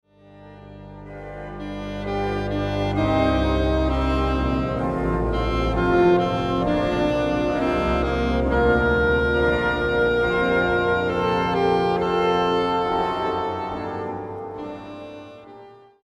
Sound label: Adagio; Corelli